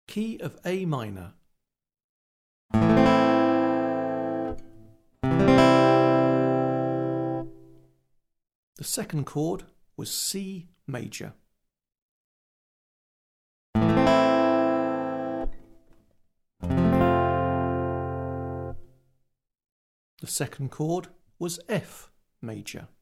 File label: Aural Harmony Test A minor, acoustic guitar; Grade 7